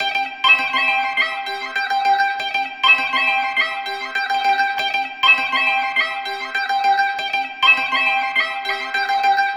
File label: Pit Strings